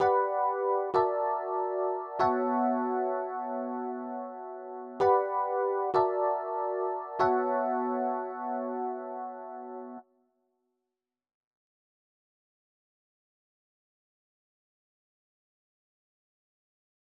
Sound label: 96 BPM B Min Rhodes, West Coast; Cymatics; GHOST (Beta Pack); Forever